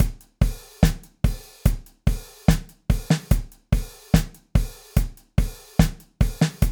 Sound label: beat